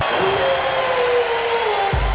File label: howl